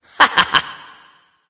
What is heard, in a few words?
hehheh; smite; kukulkan